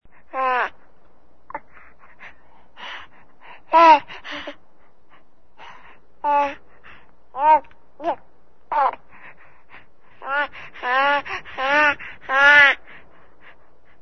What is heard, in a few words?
Bebe BABY inquiet